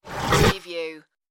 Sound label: robot servo malfunction